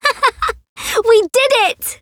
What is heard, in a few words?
laugh; fiora; we did it